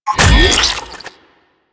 Fluid Sac Grab Sac; Warframe; Infested Eris; Encounters

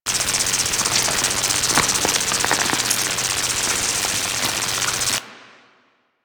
Golem Gun Fire Proj Loop; Warframe; Attacks

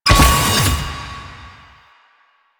Mall Sliding Door Open; Warframe; Nineteen Ninety Nine; Doors

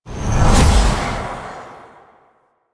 Wellspring Cast; Warframe; Focus; Zenurik

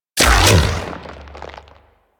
Shotgun Shot; Weapons; Revenant; Warframe; Tenno

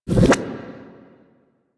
Anchor Rewind Start; Warframe; Protea

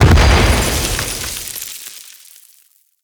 Grenade Explosion; Warframe; Veilbreaker; Kahl Abilities

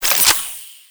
Bard Rifle Reload; Weapons; Warframe; Tenno

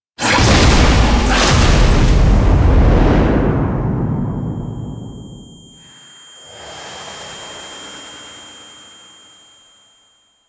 Door Destroyed

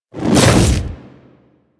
Infested Splitter Melee Swipe; Warframe; Grey Strain

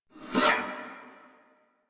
Attack Effort; Warframe; Dax Knight; en

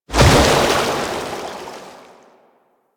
Tidal Wave End; Warframe; Warframes; Pirate

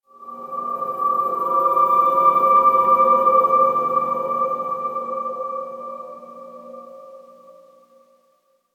Factory Texture, Ambience; Warframe; Quests; TheNewWar; Zariman

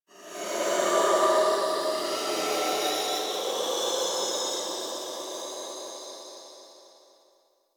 Operator Float; Warframe; Orbiter Cradle

